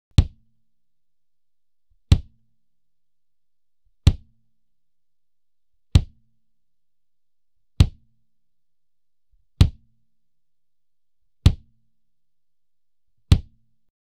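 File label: Instrumental, Kick drum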